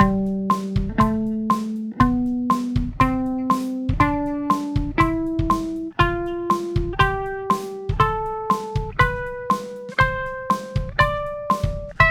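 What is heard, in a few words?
Am Mixolydian oct bpm120 half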